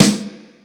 FM SD, MOD. DRUMS; East West; Global Sounds

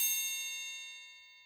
Triangle, Orchestral Percussion One Shots; Sonic Foundry (Sony Creative Software); Orchestral VOL-2

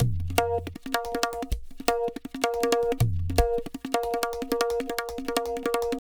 Pure Tabla, 160BPM; Zero-G

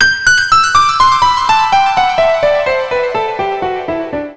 165 G, Keyboards; Total Drum&Bass; 90 s Sample CDs

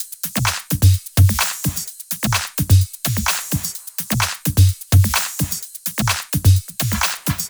Loops 128BPM, Drumloops

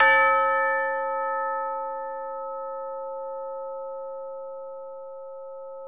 A2 CHIME, ORCH. CHIMES; Northstar - New Gold VOL-2

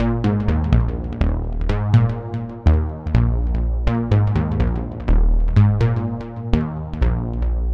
Synth Ultra Bass; Sonic Foundry (Sony Creative Software); Modular Electronica; Basses

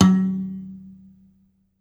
BASS KALIM, African Melodic; E-MU Producer Series Vol. 7 – Old World Instruments

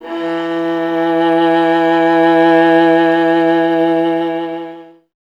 viola E; Miroslav Vitous; Solo Instruments VOL-1

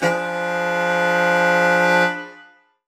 Horn Swell Alt Fdim; undercover samples

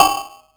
Crushed Perc, bitcrushed; musicradar

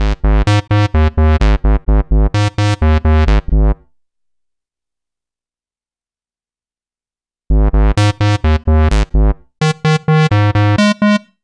dub step bass; Sonic Foundry (Sony Creative Software); Afterhours EDM; Retro Fit

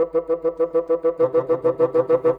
Bassoons, Orchestral; Sonic Foundry (Sony Creative Software); Orchestral VOL-2; Modern 22

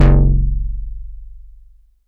Bass F#0, Prophet BuzzBass; Oldies

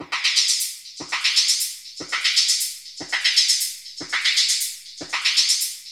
FIZZ HAT, DRUM LOOPS; Zero G; Malice In Wonderland

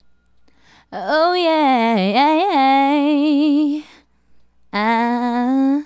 Female Solo, Hip-Hop and RnB Vocals

